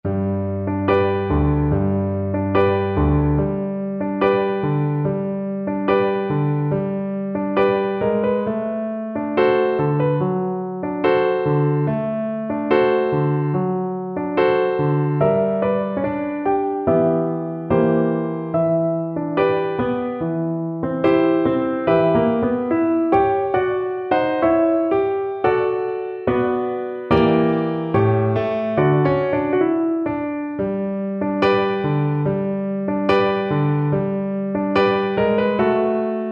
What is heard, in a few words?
tango, Cello; albeniz